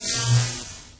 Skill Dash; Seer; GamigoNA

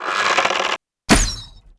Stone

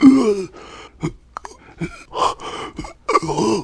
Shadow die; Seer; GamigoNA

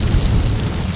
fire pole walk

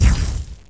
Dmg Cloth; Gamigo

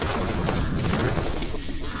Slug walk

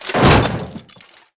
Orc Die

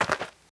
walk

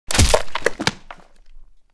Giant stand punch; Seer; GamigoNA

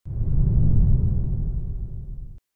Dragon Horse Walk

